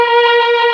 MELLOTRON; East West - Global Sounds

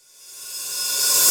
Reverse Fx; musicradar

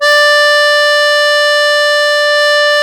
MUSETTE D3; Historical Instruments; AKAI; USB Soundscan vol.23

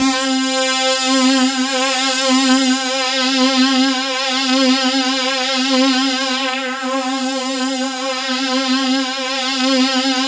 C4, SynthLine; 90 s Sample CDs; NiceBeats; SynthLine VOL-16

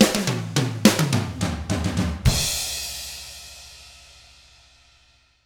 105 POP ROCK, Drums; ILIO; Double Platinum Drums 1

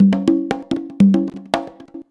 CONGA 119, Modern Percussion Loops

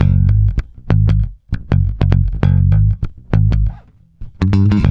G#, mute, bass; Spectrasonics; Bass Legends; DEF MUTE

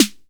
ACC. SNARE; Greytsounds VOL-1; CLASSMACHINE

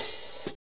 HAT, hi-hats; AMG; Now CD-ROM (Roland); NOW! Drums

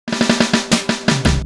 Snare Fill

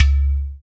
UDU; Spectrasonics; Heart of Africa

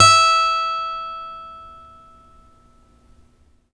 STEEL P E4, Synclavier, GUITARS; Synclavier Sampler Library

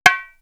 Bongo; AMG; Perc One Shots

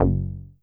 POKE BASS; BASSES

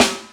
Yamaha CS6, Drums

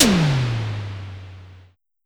Drum X Tom, Pearl

